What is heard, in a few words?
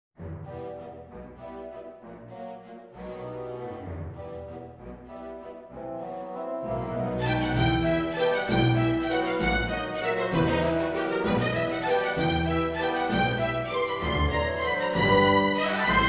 Waltz